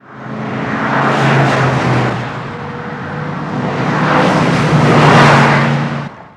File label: Car Multiple Fast By; Sony Pictures Sound Effects Series